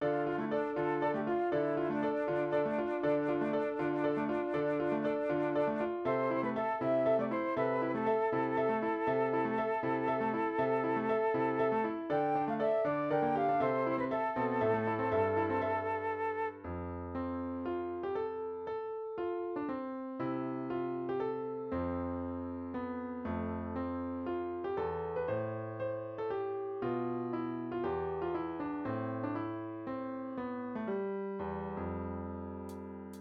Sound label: Drum Break; MuseNet Extension